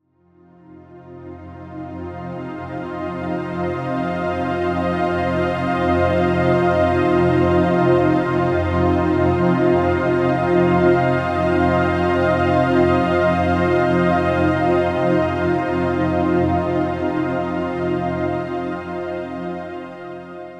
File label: Pad F; One-Shots; Pads; Ghosthack; Color Bass Essentials